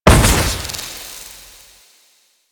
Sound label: Harlequin Object Blind Explosion; Warframe; Warframes